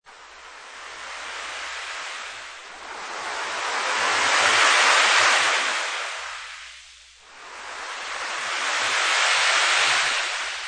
Beach Waves, A high quality stereo recording of smaller waves crashing onto a the shoreline; Ocean; Nature